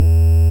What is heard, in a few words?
Buzz; Bitkits